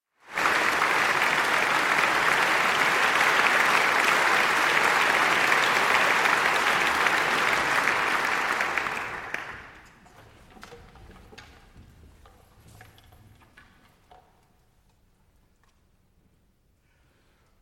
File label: suite in b flat major for 13 wind instruments; richard strauss